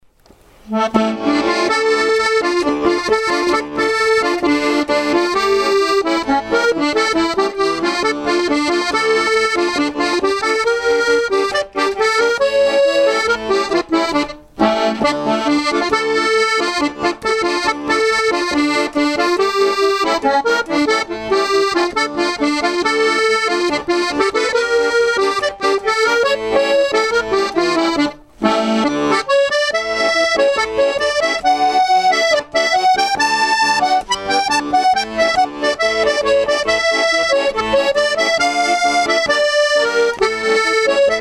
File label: klez march